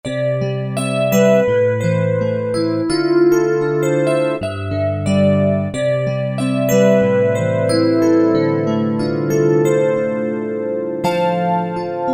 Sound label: E. Piano 2v (+ chorus), Roland SC-50 Sound Canvas; Factory sounds